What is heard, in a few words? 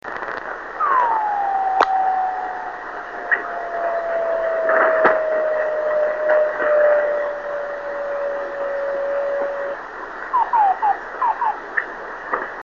drift net beacons